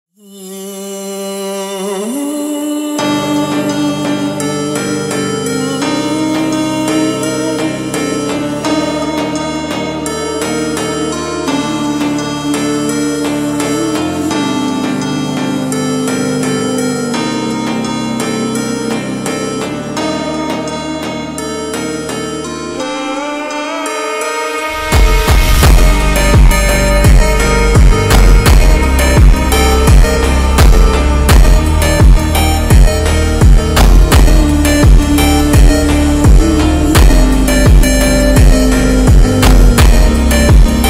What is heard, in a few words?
Phonk Slowed